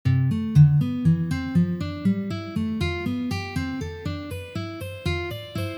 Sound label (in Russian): Uprazhnenie dlya igry gammy Do mazhor